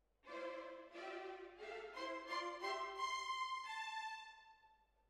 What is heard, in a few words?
Hall